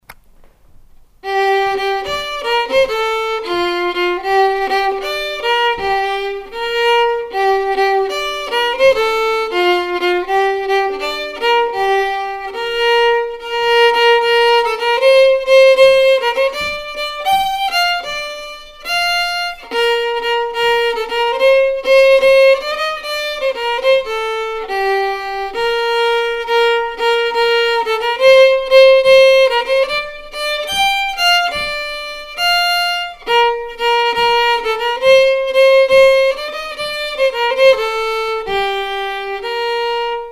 g minor, fiddle; Stingo

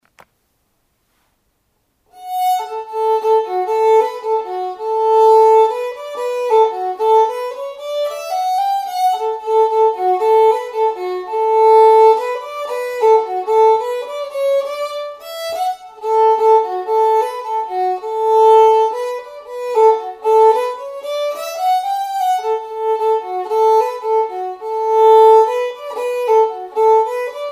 jig, fiddle

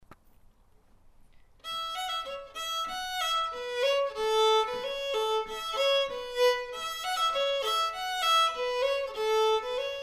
Polka, fiddle; Jessica's Polka

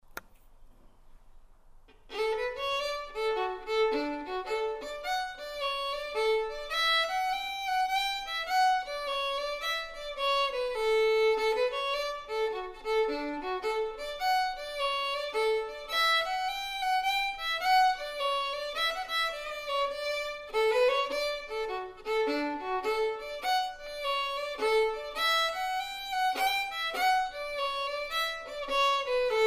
Hornpipe, fiddle; Alexander's Hornpipe

